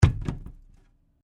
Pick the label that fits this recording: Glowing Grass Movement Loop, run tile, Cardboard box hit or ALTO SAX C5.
Cardboard box hit